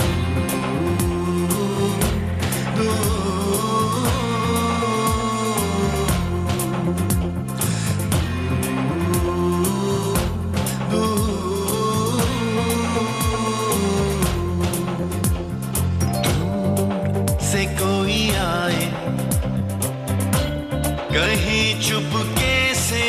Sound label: strings, ringtone